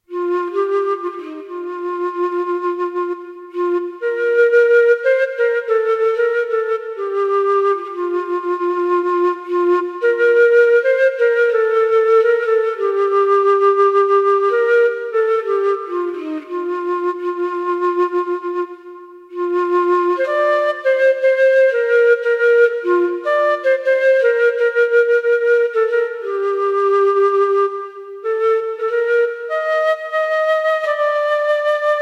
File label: Latin Fl